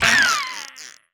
海豚死亡声

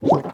女巫饮用声

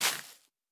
海绵 破坏声

